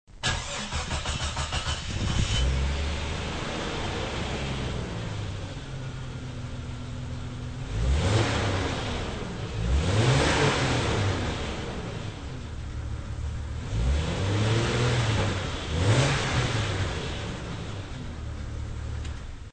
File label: jeep stock